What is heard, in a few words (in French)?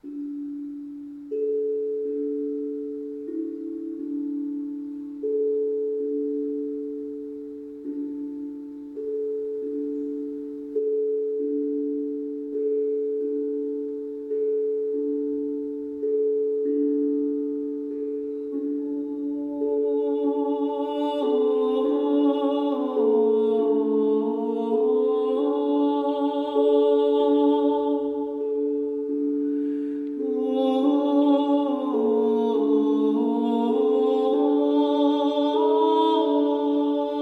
Voix et kigonki en ré